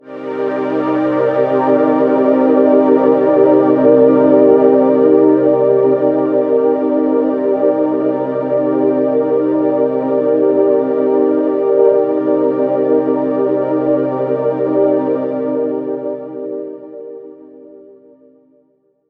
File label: pad nosta c min9; M8; M8JUNGLEPAK1